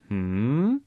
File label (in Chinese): 嗯？（疑问）